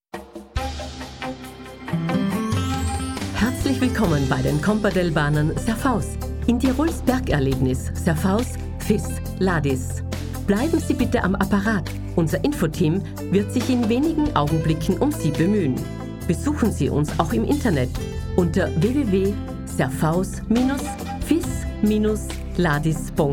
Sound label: audio jingle; komperdell